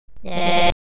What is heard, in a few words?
yay